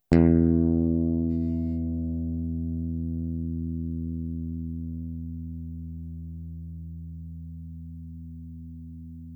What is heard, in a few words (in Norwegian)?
ekte gitar E